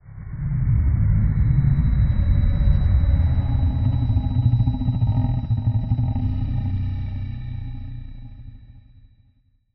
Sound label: die, golem; monsters